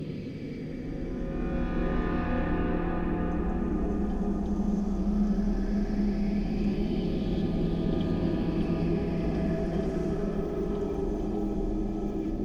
sun drone

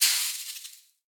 Fire Extinguish; Boomerang Fu

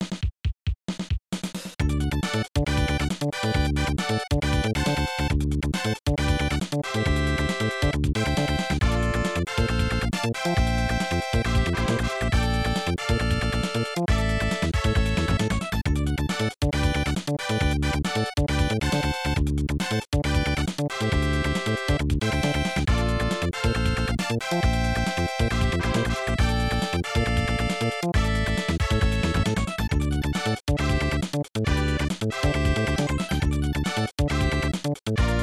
Congratulations!; Super Goal 2; SNES

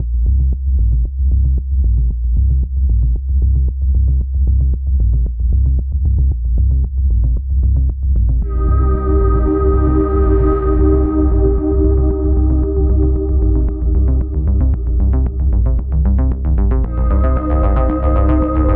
Siren Song (Low); Industries of Titan

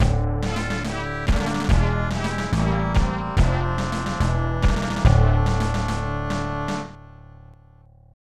End of Game (Lose); NCAA Basketball; SNES

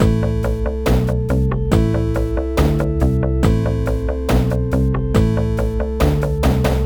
Whale Boss Intro; ravva and the phantom library

